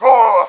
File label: wizard pain; Macworld (1998-05); Games World; Quake